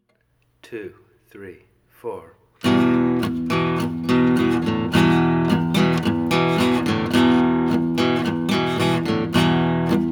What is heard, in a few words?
Rhythm Guitar; bluesy version